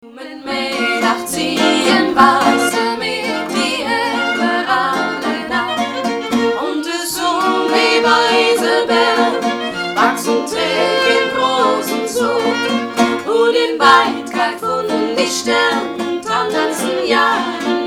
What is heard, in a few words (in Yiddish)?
di krenitse mit geige